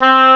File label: classical oboe; direct sound samples